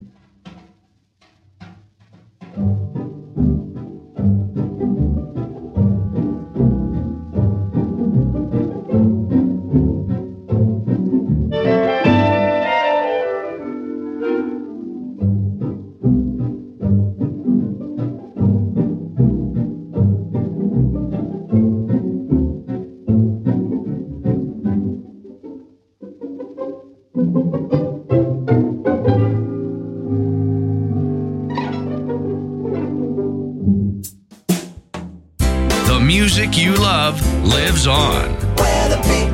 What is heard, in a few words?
Jazz Pizzicato